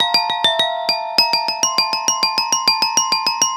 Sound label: Pelog Demung Hi; Melodic; Ambient-Melodics-II